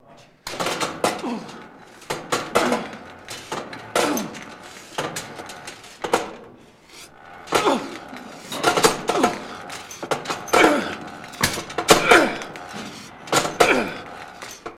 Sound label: Gym Machines and Weight Ambience